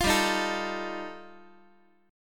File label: G 7th Suspended 2nd Sharp 5th G chord, Guitar; strummed; JGuitar